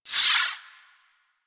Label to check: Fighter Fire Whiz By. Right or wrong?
Right